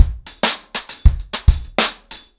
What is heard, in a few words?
100BPM, ACOUSTIC DRUMS